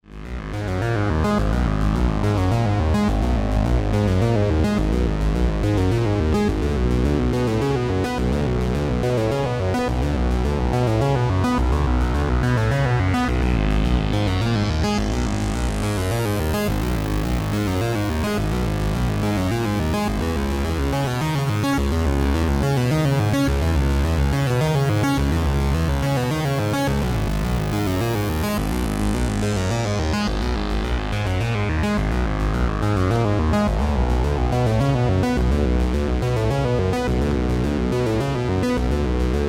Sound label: cool sequence; analog synth; SEQ16